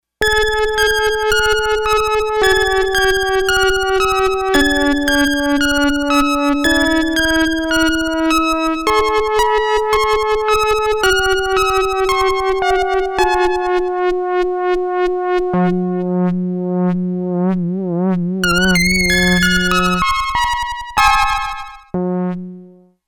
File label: Echo Repeat